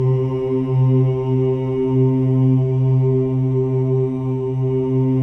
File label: CHOIR OOS, C3; Spectrasonics; Symphony of Voices